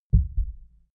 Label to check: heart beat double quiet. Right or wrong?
Right